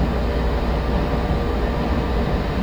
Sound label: run